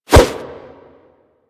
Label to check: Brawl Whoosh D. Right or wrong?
Right